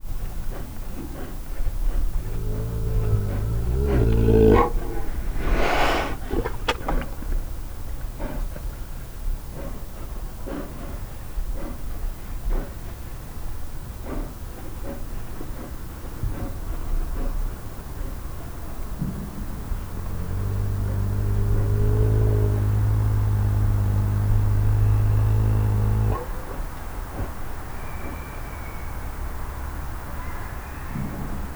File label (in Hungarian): beazonosítatlan, eurázsia, szélvédő; állathangok; nyíregyházi zoo 2010